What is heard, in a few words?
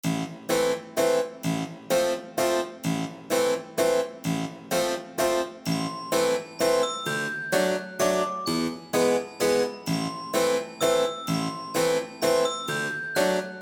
forest mansion loop intro